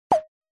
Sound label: fuzzy plush squeeze alert notification synth electronic cartoon; epic stock media